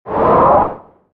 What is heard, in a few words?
pull, force